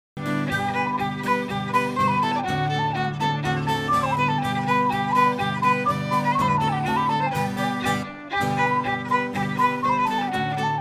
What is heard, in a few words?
polkas